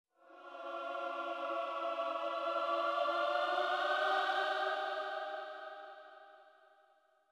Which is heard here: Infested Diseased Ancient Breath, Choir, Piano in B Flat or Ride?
Choir